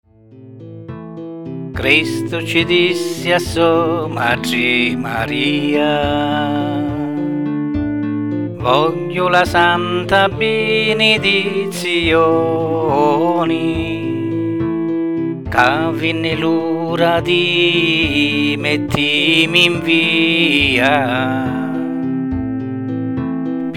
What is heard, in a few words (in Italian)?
vocestrum, canzoni religiose; lu roggiu di la passioni